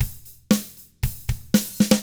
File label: 116 ROCK, Double Platinum Drums; ILIO